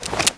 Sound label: draw pistol